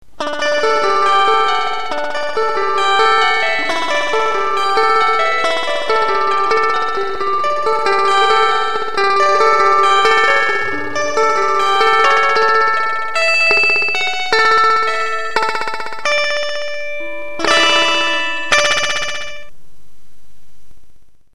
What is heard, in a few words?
delay